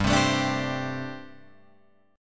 G Suspended 2nd Suspended 4th G, Guitar, Open F; strummed; 7,7,9,9,10,7

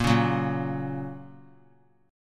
Bb Minor Sharp 5th; strummed; JGuitar

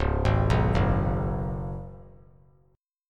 Db 7th Suspended 4th Db down; JGuitar

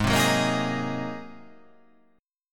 G sharp 9th G sharp

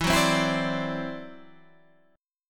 E 7th Suspended 2nd Sharp 5th, E, down

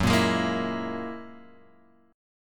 Gb 9th Gb down Guitar Open G

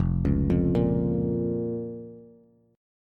F Suspended 4th Sharp 5th F, Bass

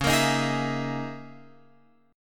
Db Minor 6th Add 9th Db down Guitar Open F; Dbm6add9 strummed; JGuitar

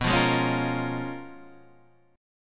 Bb Minor 7th B; Bbm7/B strummed

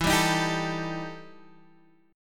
E Minor Major 11th, Guitar, Standard; 12,10,13,12,10,x; strummed; JGuitar

